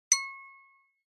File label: Smartphone UI Sound Speaker